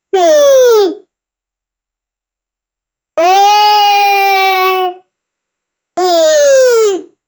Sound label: cartoon yawn cute